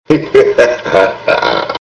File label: laugh